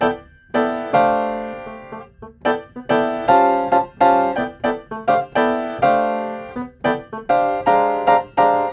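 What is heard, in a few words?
110 Trad Jazz Piano D major; Grand Piano; Digidesign; Pro Tools Essential Loops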